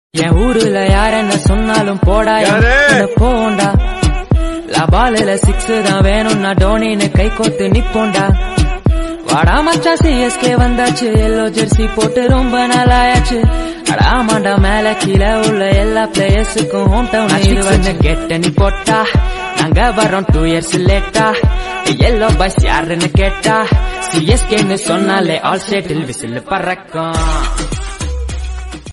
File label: bgm ringtone attitude ringtone english ringtone